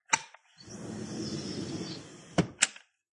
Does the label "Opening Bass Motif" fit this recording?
No